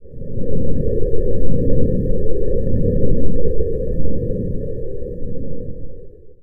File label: rnd dark, ambient outdoor